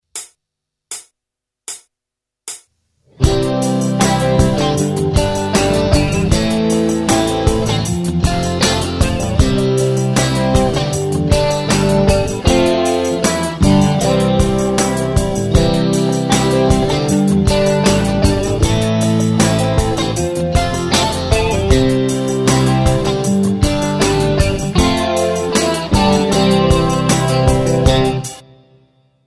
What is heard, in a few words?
jam